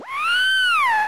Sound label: meowing cat